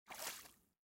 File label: step in water